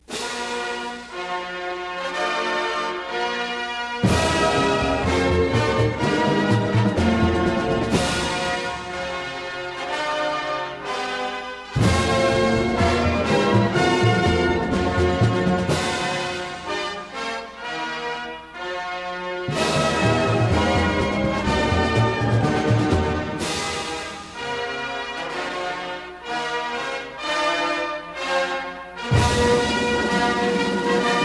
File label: Band - Fanfare